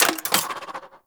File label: Spear Mech, Weapons; Warframe; Tenno; Priest Spear